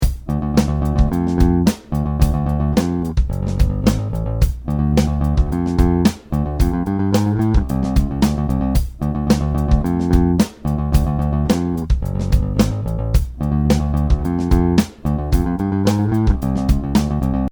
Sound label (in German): viking stellung 3; hagestrom